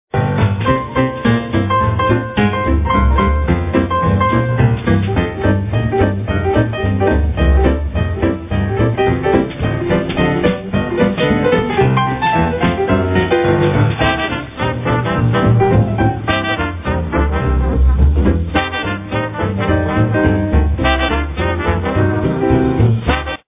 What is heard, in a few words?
swinging